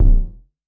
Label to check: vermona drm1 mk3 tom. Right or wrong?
Right